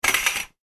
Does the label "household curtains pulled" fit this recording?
Yes